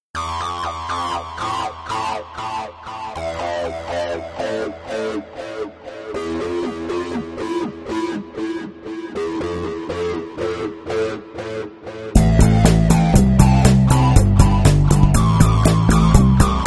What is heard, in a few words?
More Metal